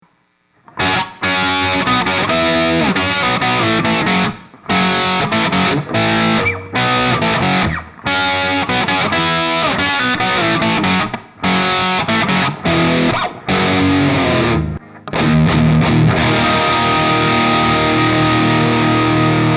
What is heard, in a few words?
slo100